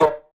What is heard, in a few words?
vocal shot; MINDMINE